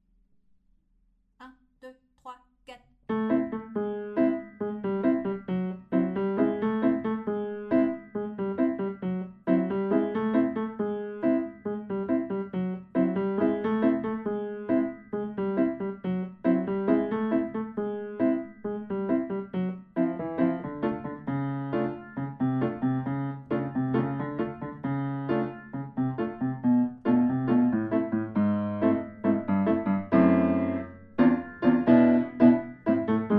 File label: Bailando Salsa Secondo